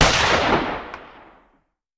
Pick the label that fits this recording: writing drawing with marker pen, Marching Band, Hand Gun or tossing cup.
Hand Gun